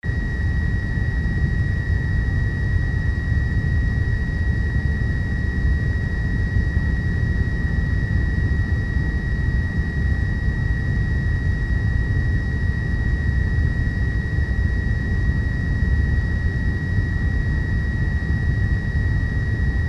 engine noise atmo

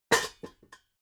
pots stack

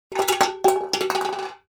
paint tin falls down

